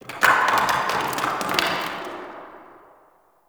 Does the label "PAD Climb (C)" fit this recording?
No